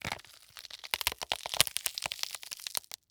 Horror Scary Bone Tear Wet

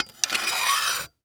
Technology Electronic Stand C Stand Extending

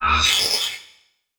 Transition Whoosh Laser; Production Element

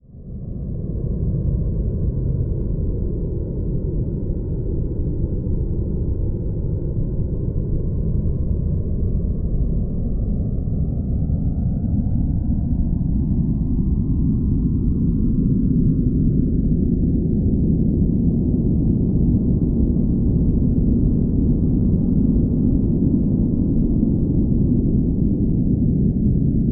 Drone Dark Rich Low Tones Phase; Production Element Imaging; AdobeCC

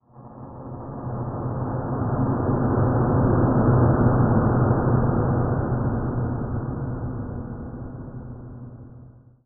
Title Transition Stab Dark Mysterious; Production Element

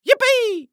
Human Male Yells Yippee

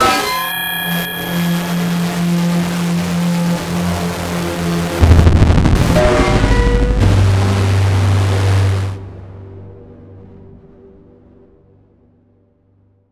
Distortion Flutter Whine Feedback Stutter; Module Production Element Title Imaging

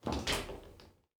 Shower Open Door, Household

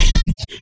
Multimedia Internet CD-Rom Flash Hi-Tech

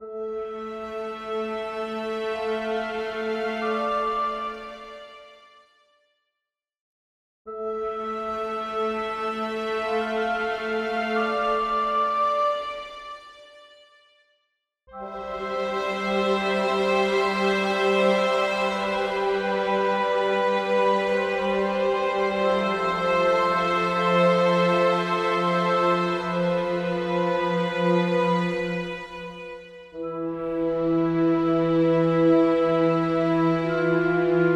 Stay Slow 48BPM, Cinematic Trailer Music

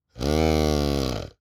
Sports Buck Caller Call Low

